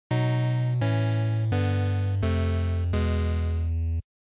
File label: NES General Game Over